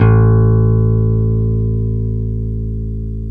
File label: PICK BASS, A1